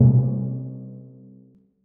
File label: timpani p rh c2; Percussion; Sonatina Symphonic Orchestra